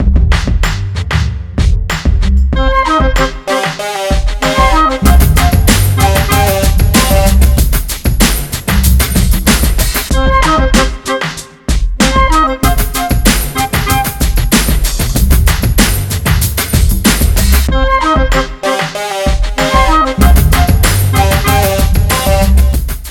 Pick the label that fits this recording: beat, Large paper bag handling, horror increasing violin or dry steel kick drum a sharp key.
beat